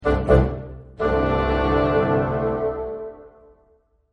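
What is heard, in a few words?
Early Woods C minor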